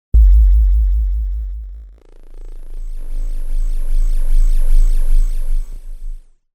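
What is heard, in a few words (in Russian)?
синтезатор эффект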